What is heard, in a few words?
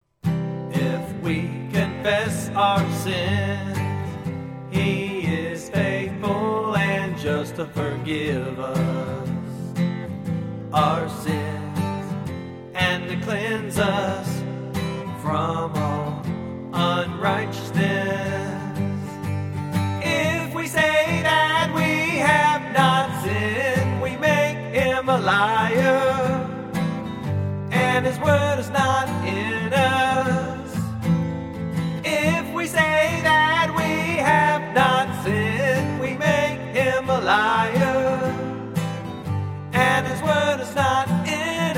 Acoustic; 1st John 1 9-10